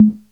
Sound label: CR 78 KIT